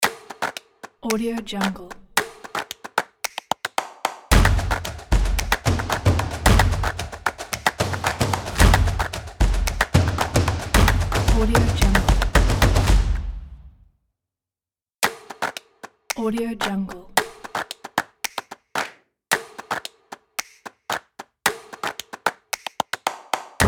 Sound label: claps